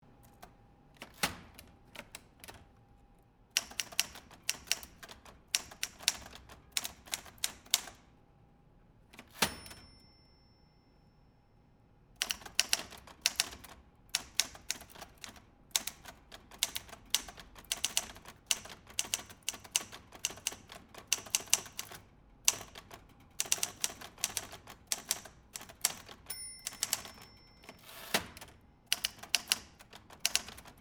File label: mechanical typewriter